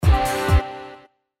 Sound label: Beat Blast